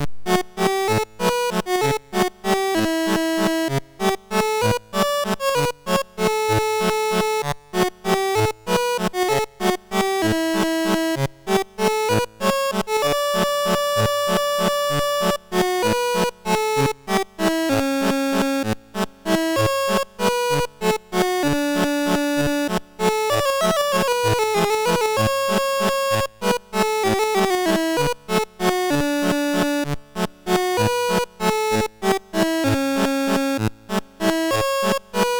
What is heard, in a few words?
Folk Song, MOS6581R2; Master Composer